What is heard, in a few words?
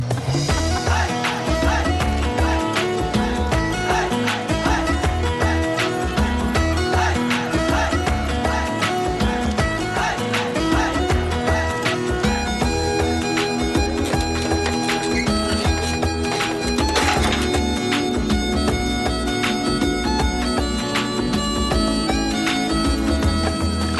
Inst; Dabangg